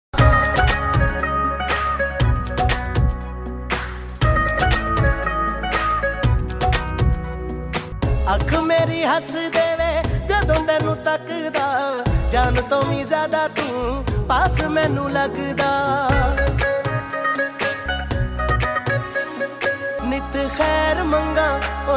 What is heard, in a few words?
Punjabi Song Ringtone